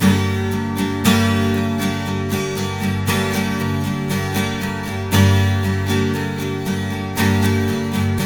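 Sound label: 12 string minor G#, guitars; Spectrasonics; Hans Zimmer Guitars VOL-1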